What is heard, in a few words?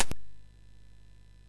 sid MOS6581R2; Labyrinth